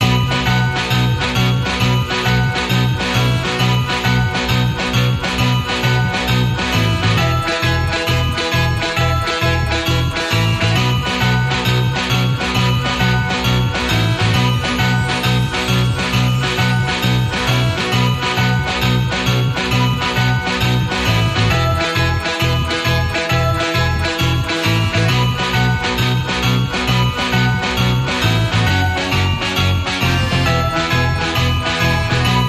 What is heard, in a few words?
Rock n Roll Christmas, No Drums